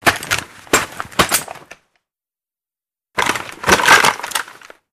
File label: Gun Heavy Moves, handgun